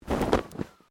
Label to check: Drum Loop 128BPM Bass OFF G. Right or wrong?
Wrong